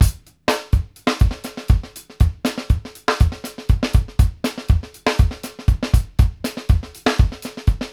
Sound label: FUNK MIX, drumworks; Sampleheads; New York City Drumworks VOL-1; KD JBEAT 120